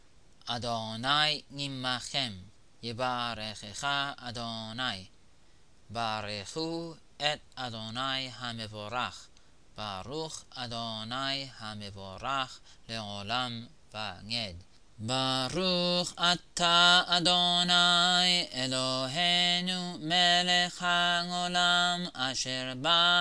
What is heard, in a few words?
Torah Blessings Low F Major with First Blessing Amen Beginning of Teamim; Shabbat Morn